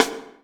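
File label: snare, E-MU Orbit-3, Drum Machines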